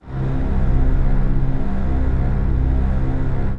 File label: big strings c2; fx; PC Gamer IT CD 40; SMACDEMO